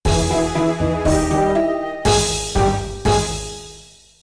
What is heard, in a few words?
hard task; Seers' Village; RuneScape 3